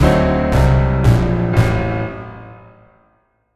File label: Tango Sequence; mass effect 3 citadel gamerip 2013; Silversun Strip